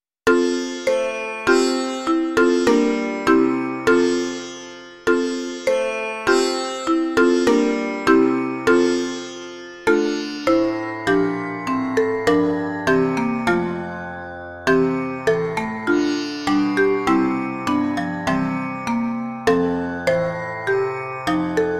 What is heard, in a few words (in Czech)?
xylorimba a sitár; Bože, jenžs dal život nám; Imaginativní verze